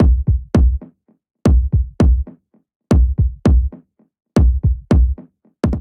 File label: Eerie Beat 165 bpm; Ableton; Factory Packs; Skitter and Step